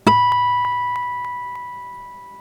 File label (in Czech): flažolet 19 fret; KMITÁNÍ A VLNĚNÍ; hudebni nastroje; CHORDOFONY